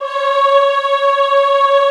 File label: EHH C#4, Classical Choir; Best Service